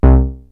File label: SYNTH BASS; SYNTH BASS 2; Megabass Remix! 2